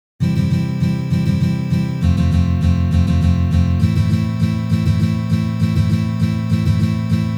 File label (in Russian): Valsovyj boj na gitare